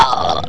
gurp; quake2; postal

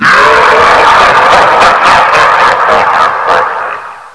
demon laugh; quake2; fox

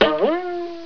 jump; quake2; prabbit

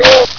fall; quake2; ontra

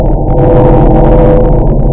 reactor; quake2; kitsune

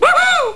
jump; quake2; homer1 4

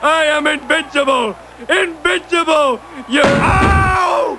pain; quake2; homer1 4

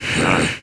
bump; quake2; blade

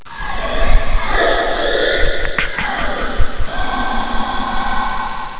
death; quake2; wraith2

